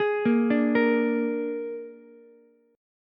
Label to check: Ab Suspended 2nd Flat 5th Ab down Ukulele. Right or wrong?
Right